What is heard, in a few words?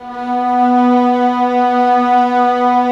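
violas sordino; Vlas Bow FX; Vas Sordino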